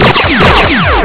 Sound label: turret; duke